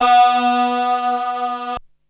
VOX; Zero G; Man Machine

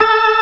organ, all stops; Keith Emerson Signature Series

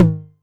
Tom Hi, Novation Drumstation 909

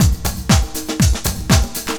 LOOP120; Sounds Good - Eurotech

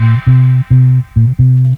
bass loop, Ragga & Dub Reggae

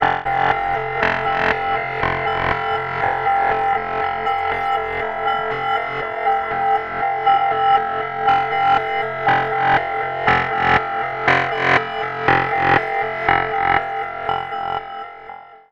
Springboard F, Ambient; Zero-G Seismic Frequencies; 90 s Sample CDs

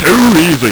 LSDj, Nintendo Gameboy; 300 Drum Machines

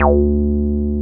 303 RES D3F, RES BASS

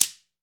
GHANA, African Perc; E-MU Formula 4000 Series Vol. 4; Earth Tones

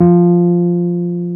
WURLY F2, Electric Pianos; E-MU Formula 4000 Series Vol. 3; Analog Odyssey

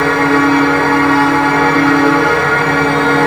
A#4 voxy; Northstar - The Wizard VOL-1; VOISH

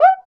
Cuica Hi, Percussion; Roland BK-5

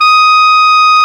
clarinet, Clarinets; Clar Jazz; Roland L-CDX-03 Disk 1

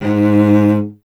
STR VC G#0, Cello; Miroslav Vitous; Solo Instruments VOL-1

